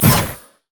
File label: infuse; LoL; soraka